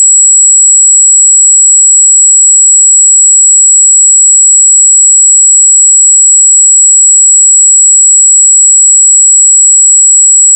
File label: average male most uncomfortable sound; gender research